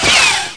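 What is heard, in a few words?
lightsaber ricochet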